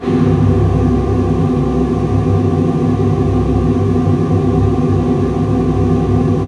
amb b, Ambi Drones, Chillout; Best Service ProSamples vol.36

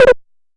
Elektron Monomachine, Drum Machines

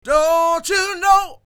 SING, Vocal Planet Blues Soul BLUESY

